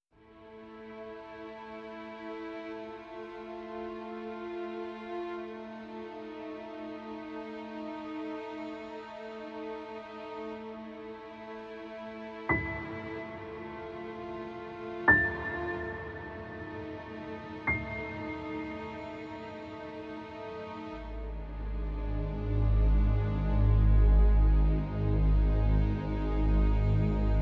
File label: Woods of Mystery calm